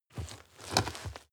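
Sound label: step dirt heavy boots scuff hard, footsteps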